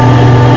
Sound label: Podbay doors